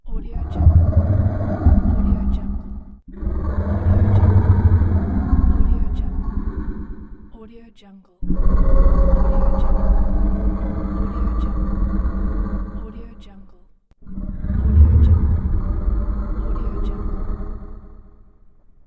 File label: monster moans groans